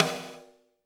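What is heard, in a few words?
snare drum, Drums; Bob Clearmountain Drums I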